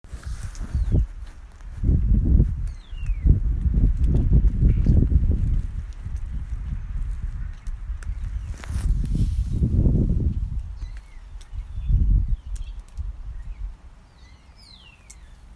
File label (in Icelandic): Umhverfishljod